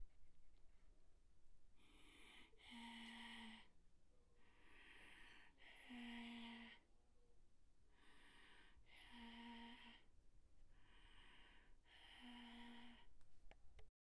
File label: Death S Breathing Sound